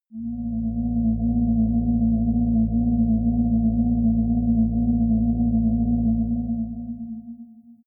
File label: floating ufo